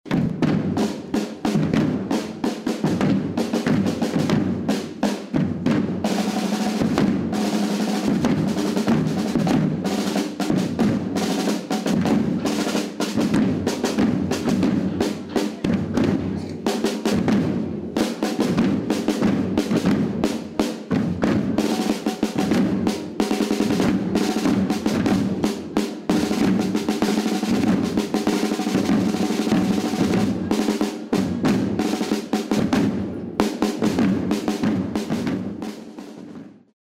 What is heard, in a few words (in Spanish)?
toque tambor nazarenos; cristo